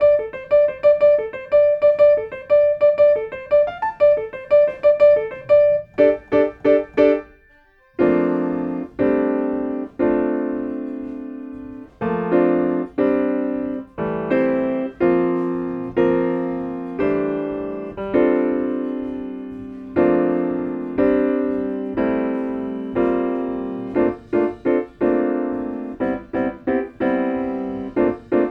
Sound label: Piano Sample